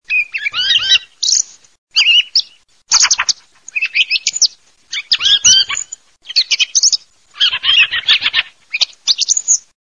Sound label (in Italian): TORDO, CESENA